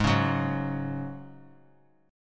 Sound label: G sharp 7th Suspended 4th G sharp; G#7sus4 strummed; JGuitar